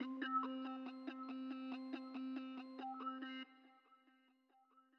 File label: Synth Destroy